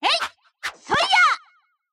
double slash; Mystical Ninja Starring Goemon

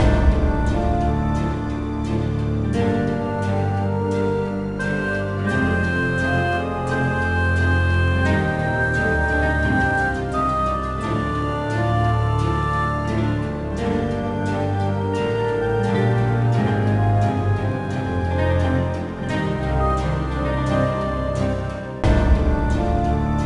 BGM Menu Greek; Age of Empires Mythologies; NDS

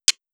Muted Tap

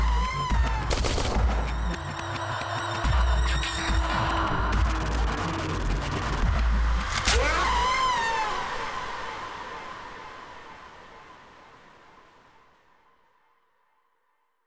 Battle Start Surround; Seargent

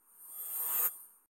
LightBeam reflect

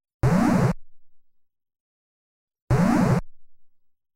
Enemy Appearing on the Battle Screen Sound; Kaijuu Densetsu The Tale of the Shell Monsters

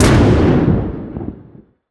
EXPLOSION Distorted Long stereo

